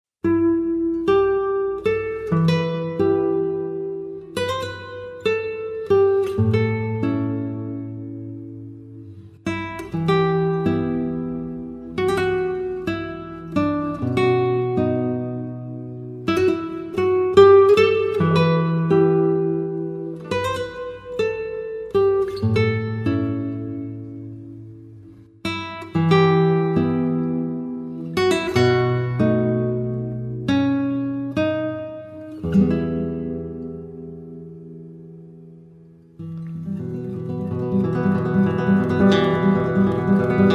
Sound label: Chill Guitar